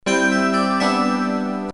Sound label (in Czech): ostrava gong